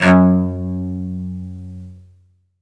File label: SOLO CEL, Strings; Synclavier; ILIO